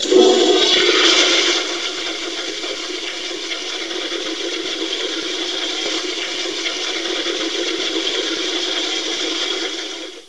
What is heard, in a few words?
Toilet